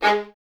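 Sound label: Violins G#3 staccato, String Ensembles; 11 Violins; Miroslav Vitous; 11 VS Stacc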